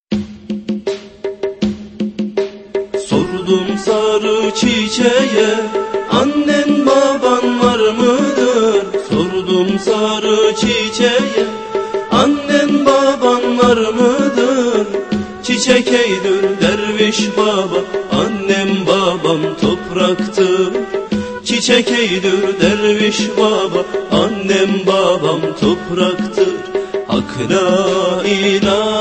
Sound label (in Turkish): Islami zil sesleri